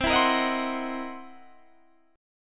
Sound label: C 7th Sharp 9th Flat 5th, C, down, Guitar, Standard; strummed; chord; C7#9b5 Chord; JGuitar